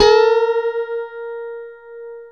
STRAT GT; Synclavier Sampler Library; KEYS AND GUITARS

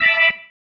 Stab One Shots, Organ

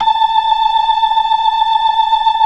93 BLOOD L 2, ORGAN; Keith Emerson Signature Series

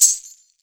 MIXED PERC; 90 s Sample CDs

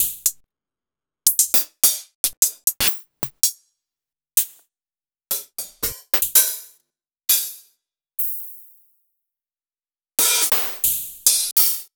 HiHats; Retrowave

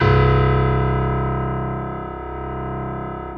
piano stereo C 0; AKAI S6000 CD-ROM - Volume 1

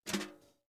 turn off snares; JazzFunkKit

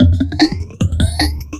FILTER, Big Beat Drumloops

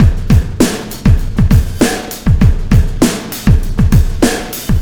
Beat, Loops

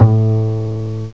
ACCBASS; CMI Sound Library Rev 1.3

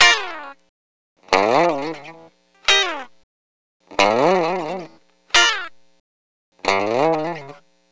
Lounge Gtr-A# Dry; Zero-G Kocktail Kollection; Construction Kitz (Lounge); Welk Lounge 090

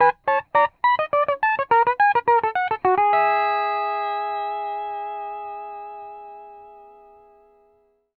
105 R, PD LOOPS

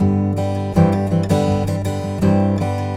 A GUIT G MAJ, 80BPM; Best Service; World Colours

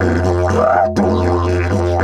120, Didgeridoo

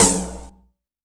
A#, synth line; 90 s Sample CDs; NiceBeats; SynthLine VOL-3

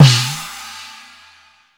EAST WEST, CYMBALS; CYMBALS 2

